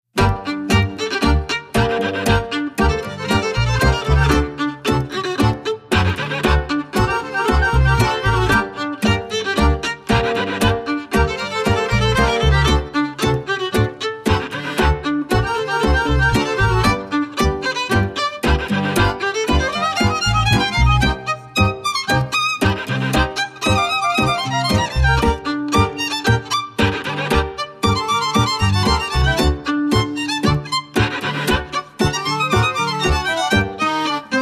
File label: TANGO